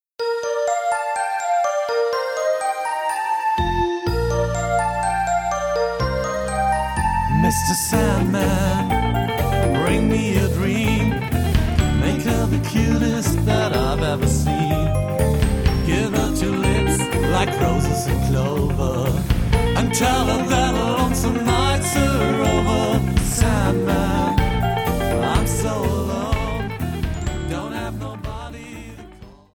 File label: ringtone